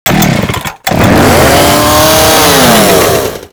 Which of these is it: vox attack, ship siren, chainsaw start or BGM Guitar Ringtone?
chainsaw start